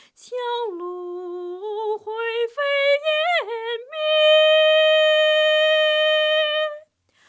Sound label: Soprano